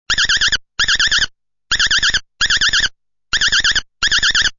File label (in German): wiederhopf, Vögel; midi; Polyfonisk musik; siemens